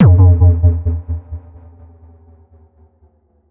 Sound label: Percussive Stab